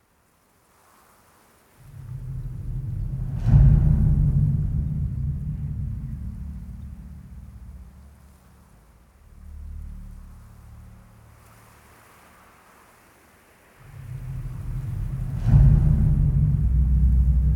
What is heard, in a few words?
Creepy; ForestBattle3